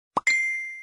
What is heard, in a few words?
old messenger tone notification